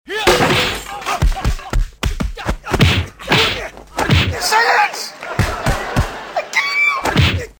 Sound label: fight